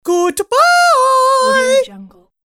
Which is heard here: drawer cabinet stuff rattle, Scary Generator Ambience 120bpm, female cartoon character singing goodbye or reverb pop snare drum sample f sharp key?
female cartoon character singing goodbye